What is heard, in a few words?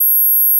Gauss Filt G7